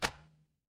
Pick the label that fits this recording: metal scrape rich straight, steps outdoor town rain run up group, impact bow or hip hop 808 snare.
impact bow